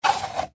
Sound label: Household Orange Juice Jug 128 Oz Full Pick Up Cap Off; Food